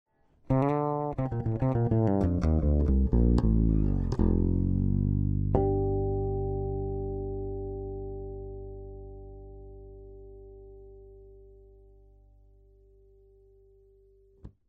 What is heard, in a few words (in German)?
soul passiv steg